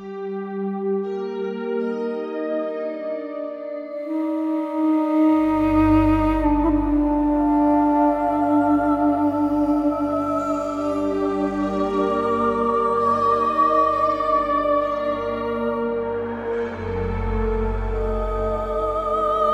Upper Shroom Music Loop

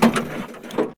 Harvester Go Lever Pull

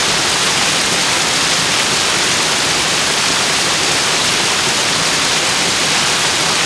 Waterfall Loop; moul

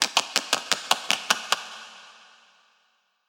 Claws

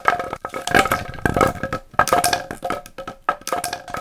Log Stn Roll Lp

